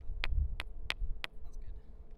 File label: Rock On Concrete; fieldrecordings